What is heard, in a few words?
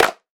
clap f key, Royality free hand clap tuned to the F note. Loudest frequency: 1972Hz; percussion; single hits one shots